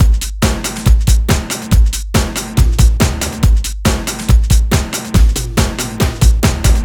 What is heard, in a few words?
Drums, Loops; Trip-Hop